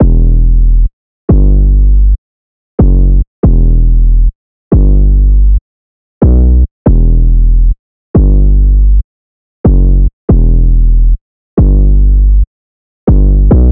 808 loop 140 Em; Slate Digital BASSHOLE Sample Pack

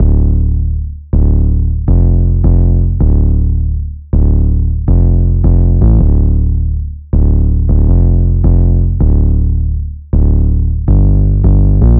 808 loop 160 Dm; Slate Digital BASSHOLE Sample Pack

